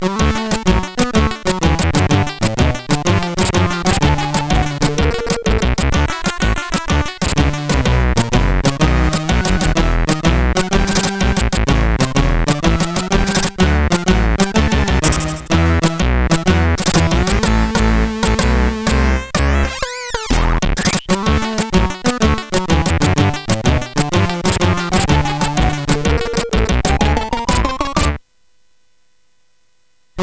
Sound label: Intro, CSG8580R5; hvsc